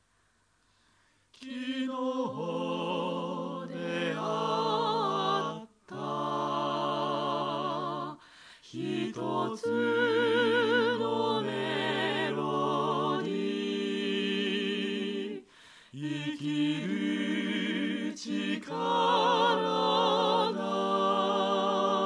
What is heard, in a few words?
voice, choir